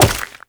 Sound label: Wall Jump Ice; Footsteps; WallRun; Warframe; Characters; Tenno